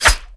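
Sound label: flyer attack; lmctf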